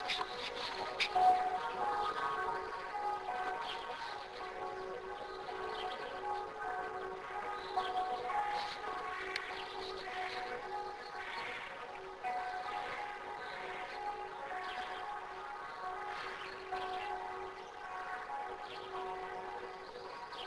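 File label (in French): cloche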